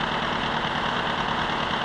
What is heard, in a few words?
SLOW LIFT; moonchld